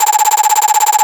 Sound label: Bottle Blow 2